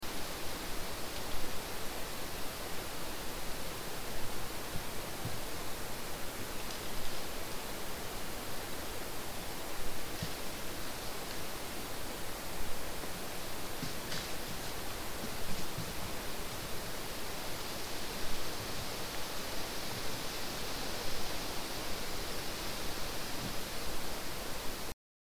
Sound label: 4AM Run Footfalls; Sasquatch